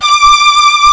Deep String

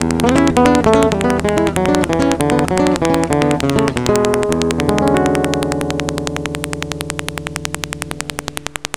Nylon, Cool Licks; Sound Factory

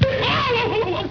KNOCK

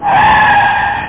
skid; CU Amiga Super CD-ROM 14

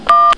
phone; office; ClickArt 300,000

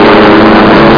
plane; TPUG Users Group CD; AMIGA; FileIISG-Demo

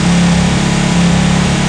Motor; Racer

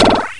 echo gun

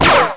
Sing Shot; SO2K

